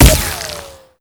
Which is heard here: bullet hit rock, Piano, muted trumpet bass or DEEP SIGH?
bullet hit rock